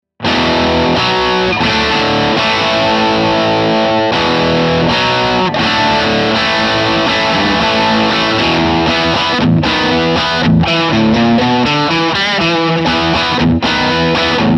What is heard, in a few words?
rock chords; jensentone